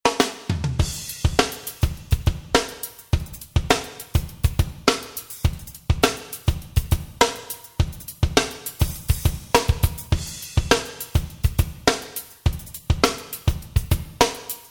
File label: Plate